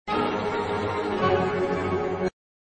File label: D american baroque; MagnaLoops